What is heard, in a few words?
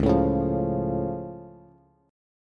Db Augmented, down, Bass; strummed; JGuitar